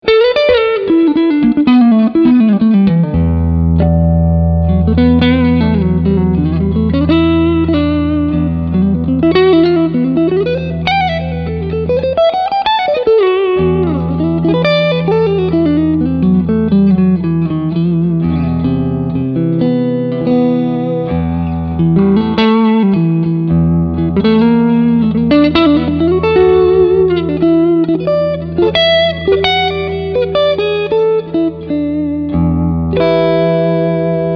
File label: Cleansound I